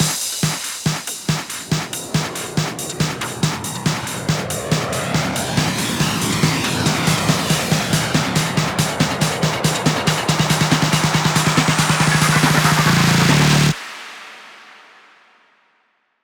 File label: 140BPM 8Bars, HandsUp & Trance Fills; Reload; Vengeance Samples